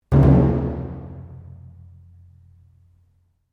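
Drum Taiko Odaiko Double mezzo forte, Large Japanese Taiko Drum; Miscellaneous Musical Sound Effects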